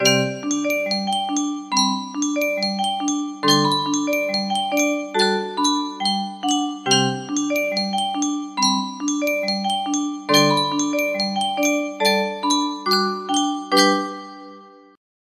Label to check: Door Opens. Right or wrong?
Wrong